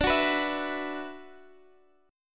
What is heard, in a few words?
Gb 7th Suspended 4th Sharp 5th D